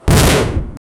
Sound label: a fireball being casted